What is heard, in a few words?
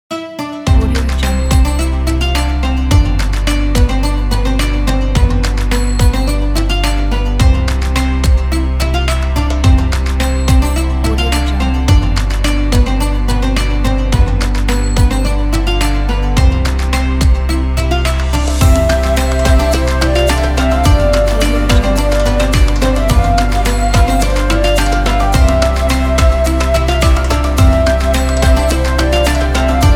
ramadan; audiowinner